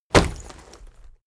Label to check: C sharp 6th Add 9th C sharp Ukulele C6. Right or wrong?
Wrong